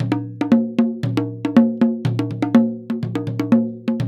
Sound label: PERCS 120BPM; INIS - Opium